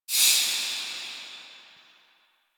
Crafting UI Open; Warframe; Cetus

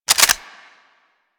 Pistol Reload, Weapons; Harlequin

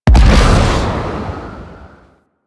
void comet appear; Warframe; Void Storm; Railjack

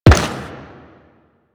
Step Metal, Footsteps

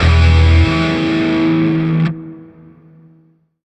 Grineer Shawzin Pent Maj Chord F; Music; DE; Warframe

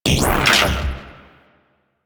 Battery Activate; Warframe; Cowgirl

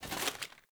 Foley Action Armour Walk; Player; Mother Armour

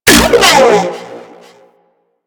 Space Drone Stasis Beam Fire

